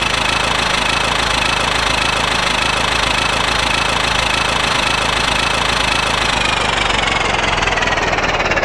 model t engine runs slows; laundry truck; sound effects and sound reinforcement

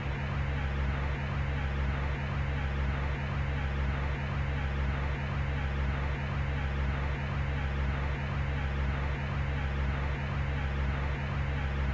Radio hum; sound effects and sound reinforcement

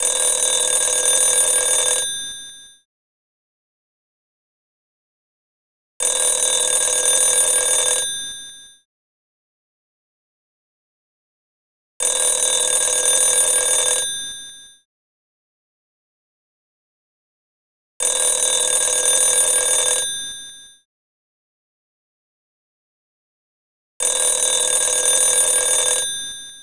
telephone rings; sound effects and sound reinforcement; warbucks

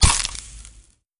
flash triggers; sound effects and sound reinforcement; The Passion of Dracula

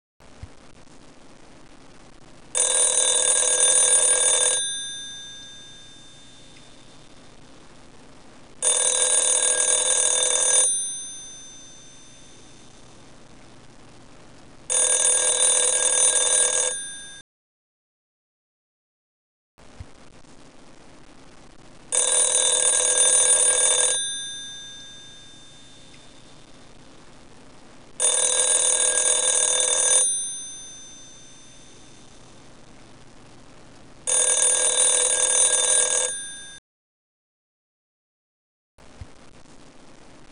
telephone rings; sound effects and sound reinforcement; warbucks

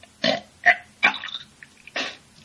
barf; sound effects and sound reinforcement

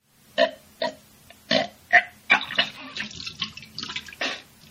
barf; sound effects and sound reinforcement

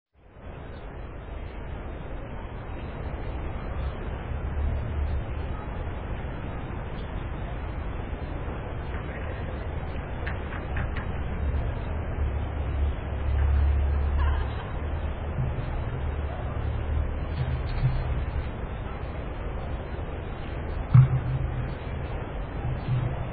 Munich park atmosphere; sound effects and sound reinforcement

